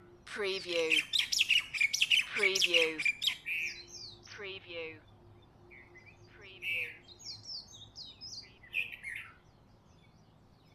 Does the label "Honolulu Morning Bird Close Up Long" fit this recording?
Yes